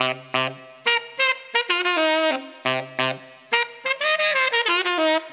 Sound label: Boom Boom 8 Beat